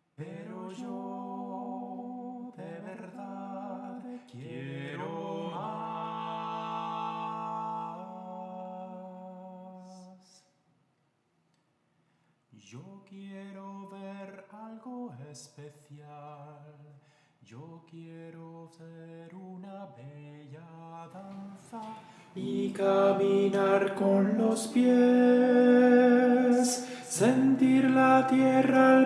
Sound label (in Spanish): bajo; LASIRENITA